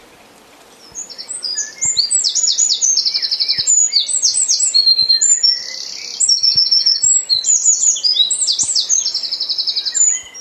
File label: Eurasian Wren Troglodytes troglodytes; chant des oiseaux des jardins